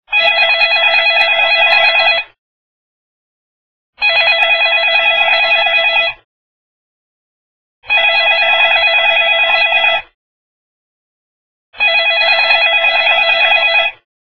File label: ringer; ringtones